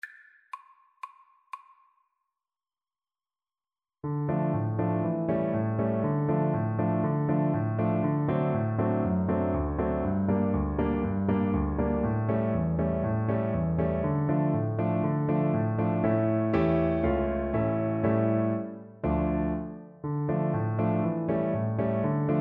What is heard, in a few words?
trombone